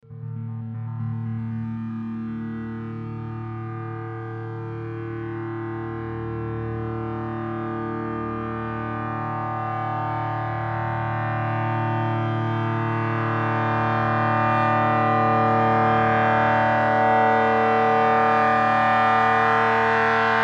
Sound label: 852hz; 852 Hz - Balance; third eye awakening unleashing intuition clairvoyance; Solfeggio-Frequenzen